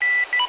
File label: remote end, radio sounds